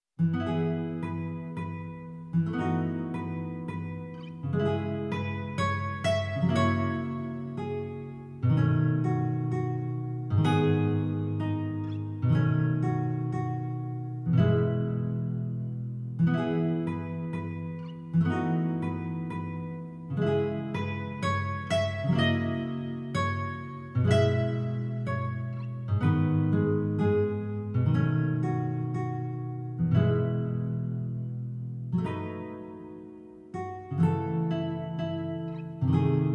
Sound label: guitar; MacDowell; Woodland sketches; To a Wild Rose